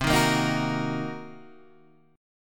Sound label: C Minor 7th Flat 5th, Guitar, Standard; Cm7b5 strummed; JGuitar